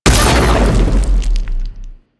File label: Ambulas Leaping Ability; Warframe; Corpus